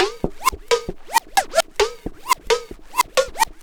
scratch bell, Drum loops

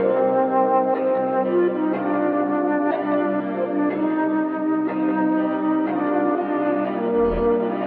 flute, Sad; Loops

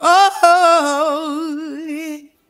"oh" sung

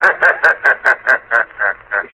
laugh evil

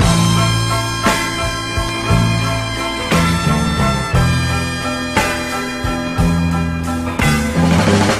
piano lead in, Loops; Phonky

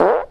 fart short

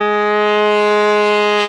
Horns

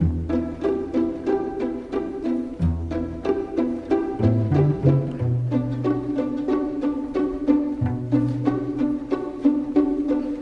strings, Sad

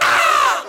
scream

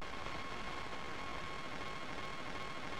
radio fuzz; Rumbles

